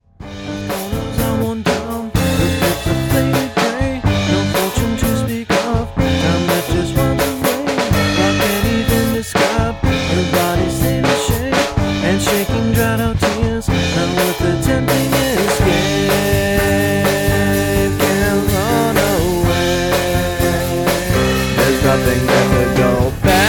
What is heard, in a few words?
Plucky; Xhaust; Demoitis